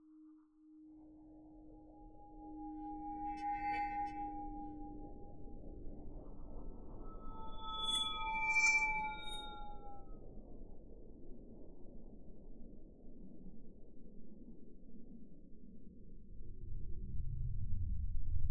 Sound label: Inside Track Long Swirls